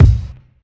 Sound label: wet bass drum sample F sharp key; kick drum samples